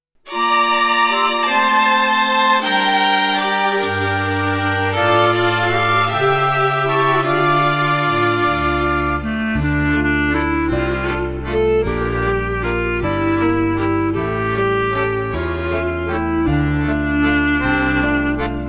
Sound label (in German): Die Uhr An Der Hand Instrumental